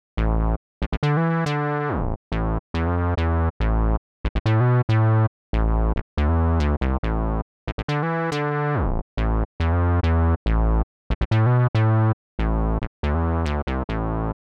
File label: Bass Mini V